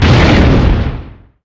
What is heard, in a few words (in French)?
explosion; impact